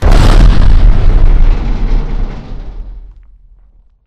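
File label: explosion mid distant kaboom; corporate; Titanfall 2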